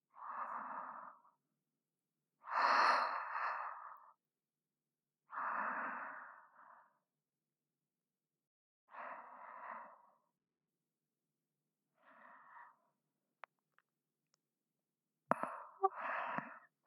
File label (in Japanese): あまとろ王女の寝息 左, ASMR; ループ; SE有り